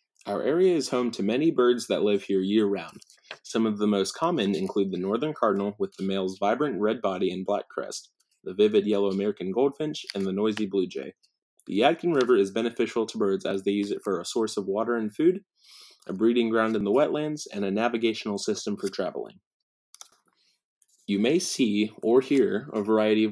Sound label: native birds